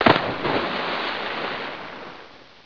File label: splash